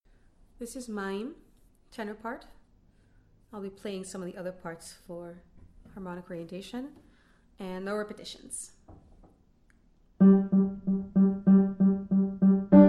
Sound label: Mayim Tenor